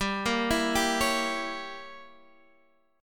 C sharp Diminished 7th G

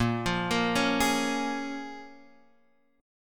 Bb-Minor 7th, Bbm7 chord; Bbm7 arpeggiated

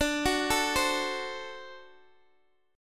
D 7th D Guitar DADGAD

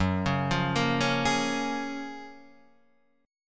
Gb Major 9th, down, Guitar, Standard; arpeggiated; JGuitar

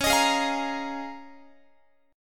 Db 6th Add 9th Db down Guitar; Db6add9 strummed; JGuitar

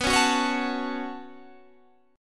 B 7th Suspended 2nd, Guitar, Standard; B7sus2; strummed; JGuitar

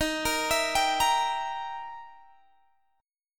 E Minor Major 7th Flat 5th, D sharp

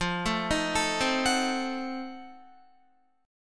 F 7th Flat 9th F down Guitar; F7b9; arpeggiated

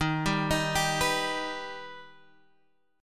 D sharp Augmented D sharp, Guitar

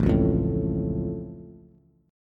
A Augmented A, Bass

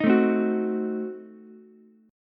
C Diminished 7th down Ukulele; strummed; JGuitar

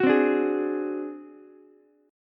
D 7th Flat 5th D down Ukulele; D7b5 strummed; JGuitar

